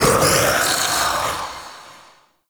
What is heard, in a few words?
Mockery Male Vocal Charge Scream; Characters; Enemy; Vocals; Mockeries